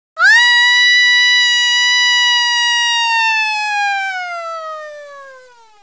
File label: fall yell